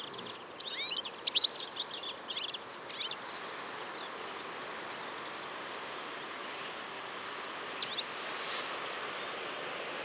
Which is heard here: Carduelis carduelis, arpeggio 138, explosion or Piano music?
Carduelis carduelis